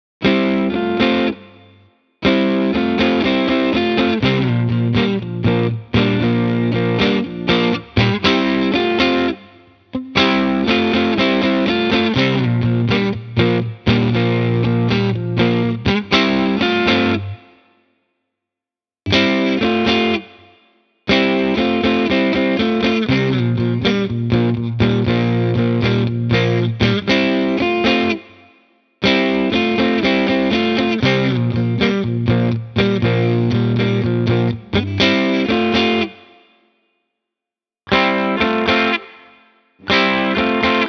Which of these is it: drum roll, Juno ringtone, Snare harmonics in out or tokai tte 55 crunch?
tokai tte 55 crunch